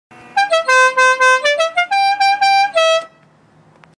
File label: Real Dixie Horn